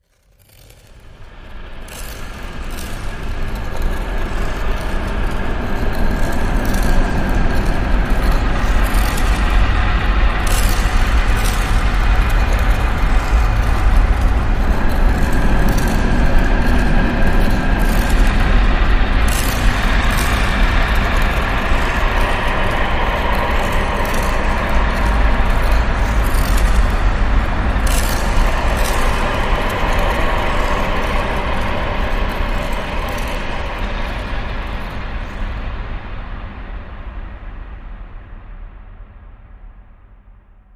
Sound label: Ice Pursuit Low Atmosphere